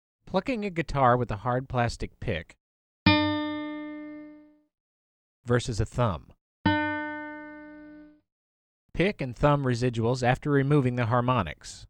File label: Hard and Soft Plucks